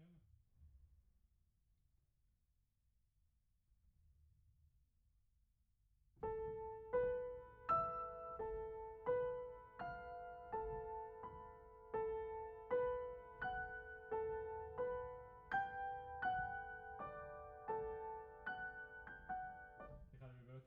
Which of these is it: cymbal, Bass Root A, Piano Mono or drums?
Piano Mono